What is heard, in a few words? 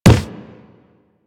Run Wood, Footsteps; Warframe; Operator